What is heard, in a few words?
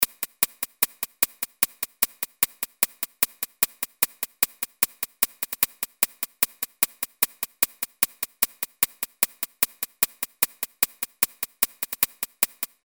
Bomb Hats; Industrial